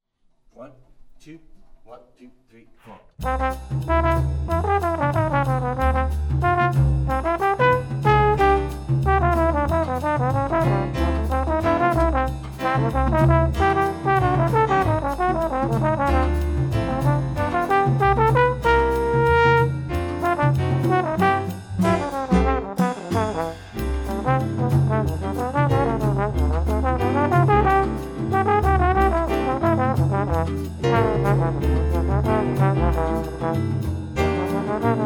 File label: latin etude tenor trombone